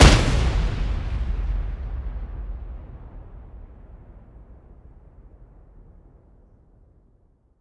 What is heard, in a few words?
Missile Impact; lancer weapon fx